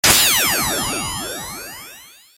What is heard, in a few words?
Laser Gun